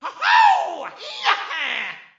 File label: UHAAUUUU YAHYYYY